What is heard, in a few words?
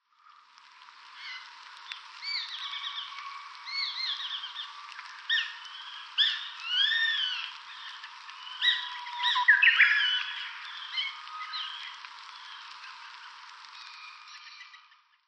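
kakesu, Eurasian Jay; utsunomiya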